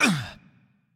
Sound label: hitreact large; smite; roman archer